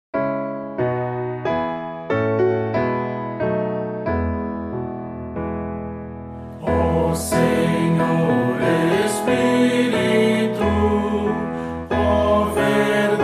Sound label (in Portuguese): voz piano